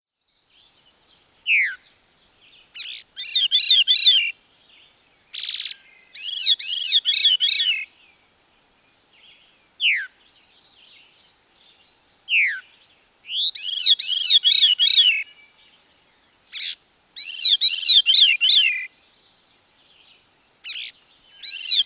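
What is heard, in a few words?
sound thrush; zvuki ptits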